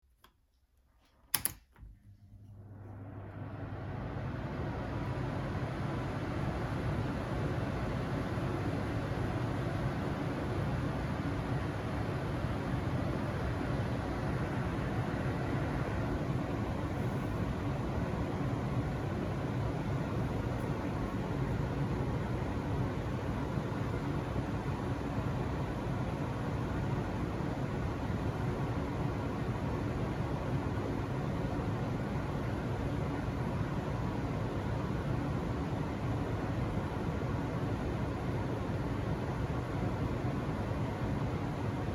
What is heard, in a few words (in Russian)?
zvuk vytiajki kuh